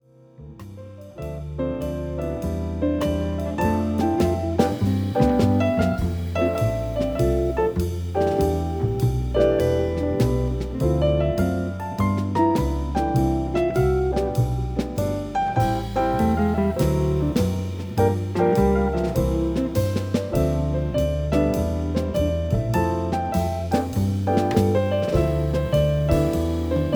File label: jazz dolby C; zx-5